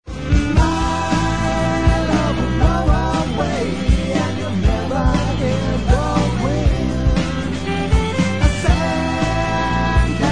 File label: Bkg Voc